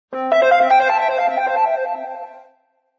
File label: ring in; Samsung SCH-i539; Basic